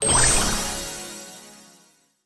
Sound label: spark light funnel explo